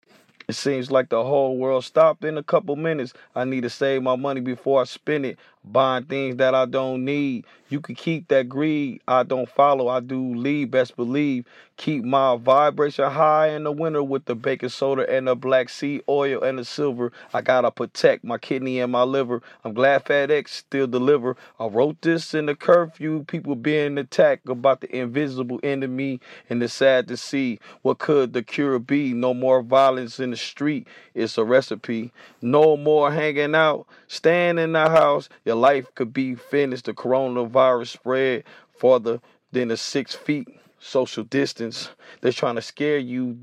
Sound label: hip hop, 172, G sharp, Acapellas